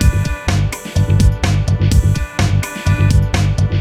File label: Dance Music